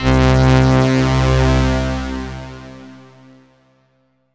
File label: Ensoniq ZR-76 Elka Strings C3